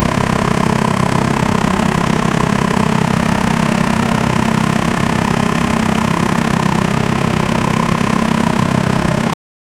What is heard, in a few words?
seamless looping, scooter